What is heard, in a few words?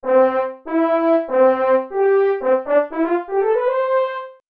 french horn